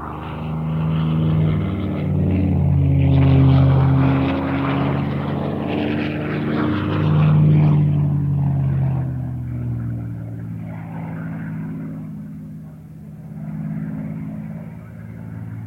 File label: supermarine spitfire beating up the aerodrome